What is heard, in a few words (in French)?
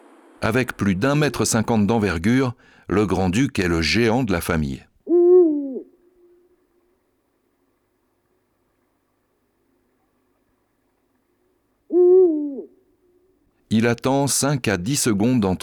Hibou grand duc; abc de la nature